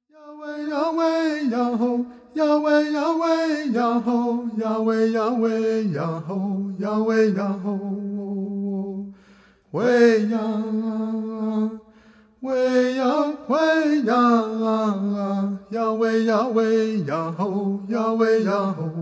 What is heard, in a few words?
Chants of Happiness